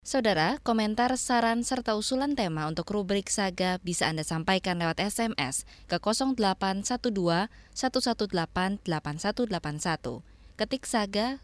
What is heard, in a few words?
Closing; SAGA